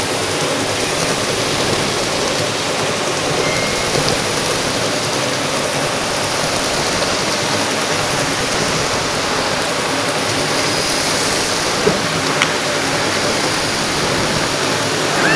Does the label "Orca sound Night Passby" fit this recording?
Yes